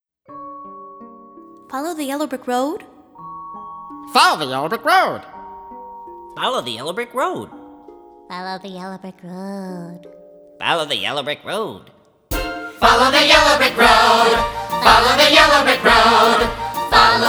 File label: Complete Tracks with Guide Vocals